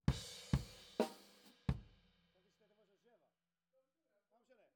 KIT

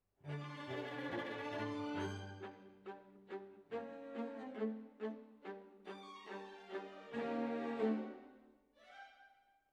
Violas, jazz for piano

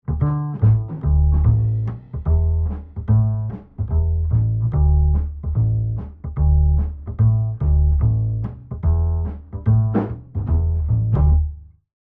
Bass, Jazz for piano

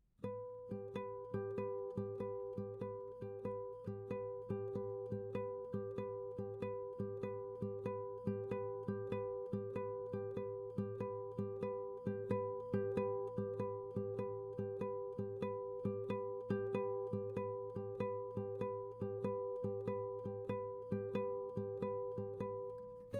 GTR

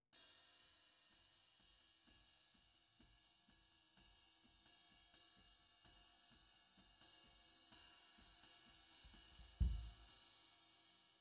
Kick IN

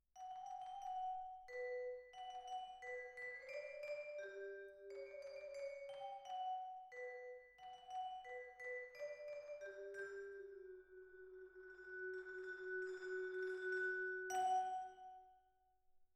Vibra

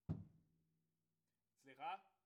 Ride

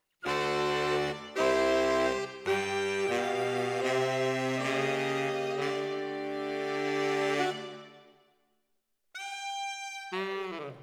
ORTF SCHOEPS, piano

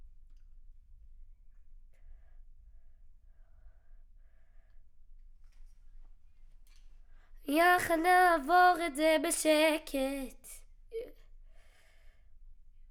Children Choir; Studio Bekol Children Choir

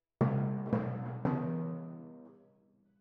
Twin F Timp

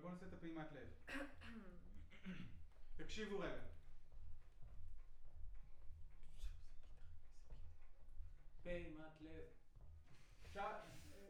Choir

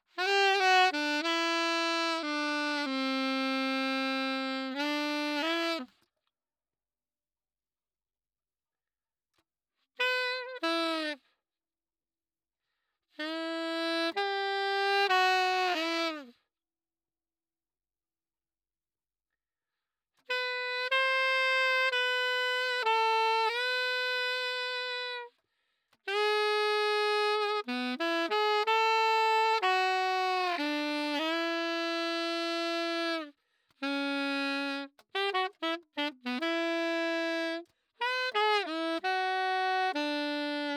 Sax

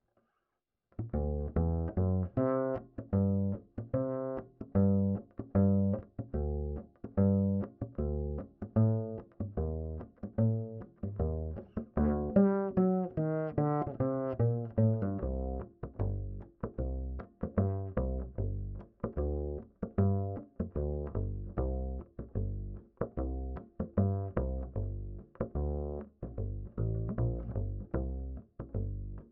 Bass Line, Ragtime

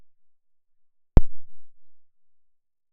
Snare Bottom, 94bpm

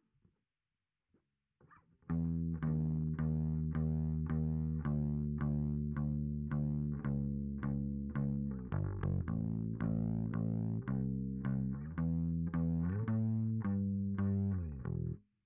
Bass Sansamp

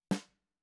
Snare

